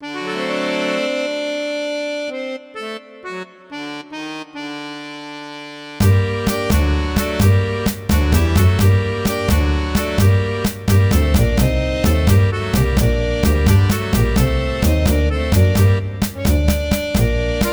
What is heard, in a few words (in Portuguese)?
Música apenas acompanhamento